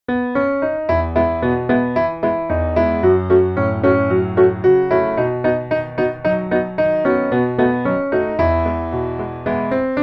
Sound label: piano